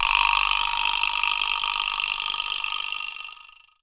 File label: vibraslap